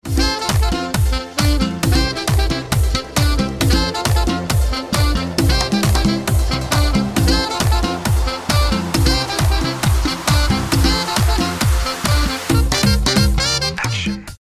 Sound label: RINGTONE